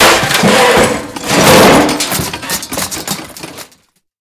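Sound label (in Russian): шумное падение больших металлических предметов с высоты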